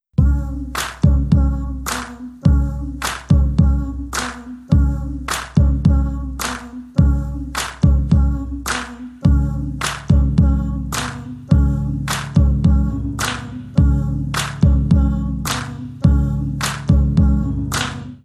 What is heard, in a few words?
warm bass